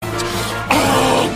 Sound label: Gorilla